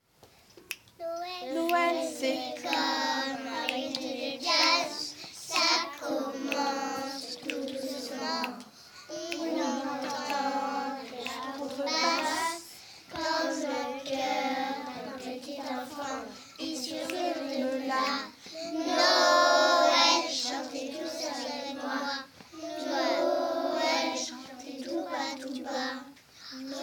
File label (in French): Chant noel classe GS